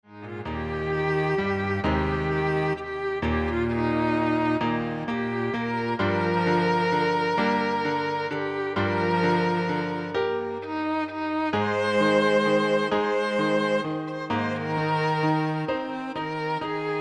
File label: Piano Trio